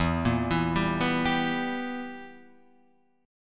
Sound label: B Major 7th Suspended 4th Sharp 5th E